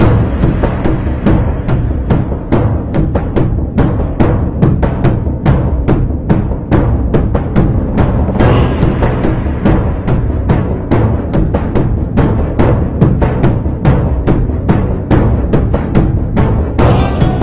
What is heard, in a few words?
ship fight, music; svencoop; sence; royal guards